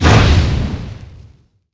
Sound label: reduce damage